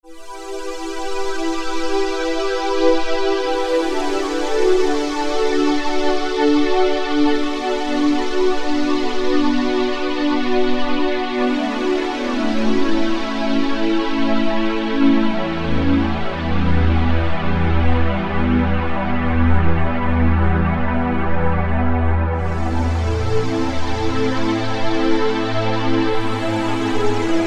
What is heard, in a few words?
SYNTH PADS; Cloud Break; Apple GarageBand 2